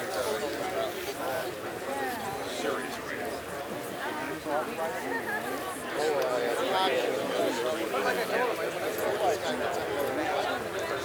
Mansion Crowd; Levels Hub Mansion; Twitch Drums; Hub Mansion Crowd Walla

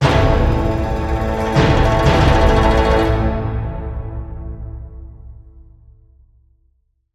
Lose

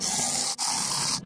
Sfx - Handwork; Farm Mania

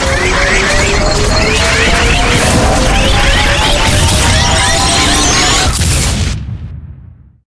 Ice Boss End; jett rocket; wiiware wii

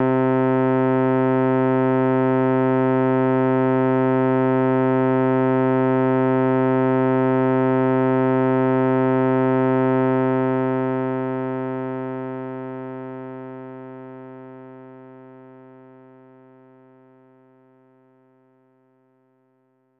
Unused Jingle; Controller Test Cartridge; snes

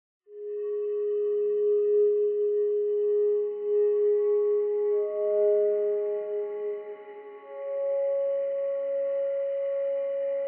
Toy Flute and Pads Mix; the last of us

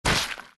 Ink Burst

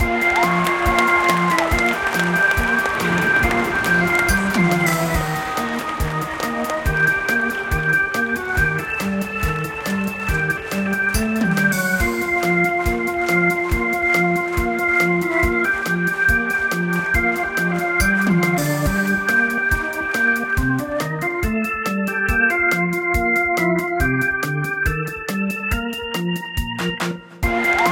Win; Cooking Dash

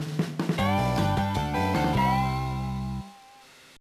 Level Complete!

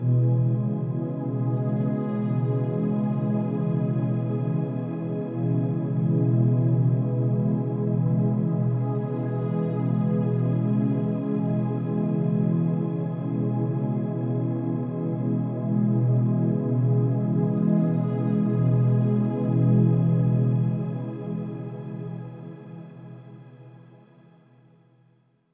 Overlay Drone

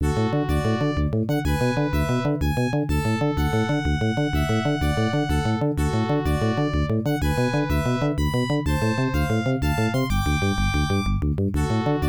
Unused BGM

